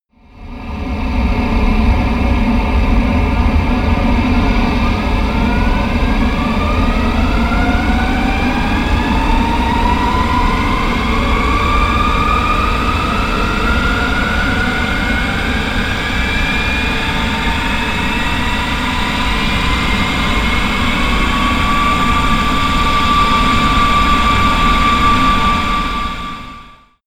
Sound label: plane airbus a320 turbine rev up